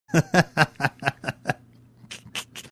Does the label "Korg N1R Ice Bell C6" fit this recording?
No